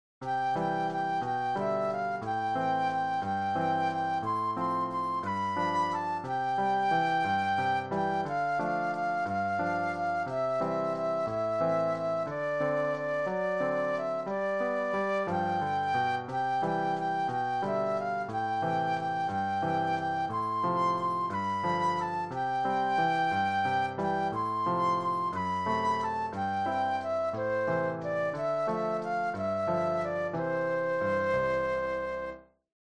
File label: Over the River and Through the Woods Flute Solo